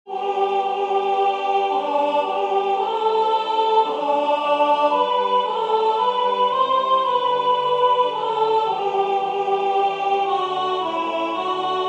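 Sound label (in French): soprane, Musique sacrée; Adeste fideles; Willcocks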